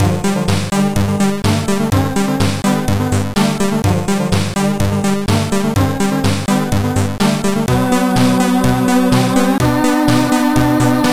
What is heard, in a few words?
amiga game end; obliterator